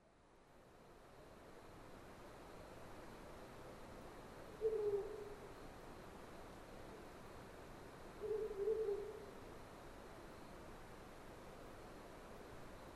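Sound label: Ural owl; fukurou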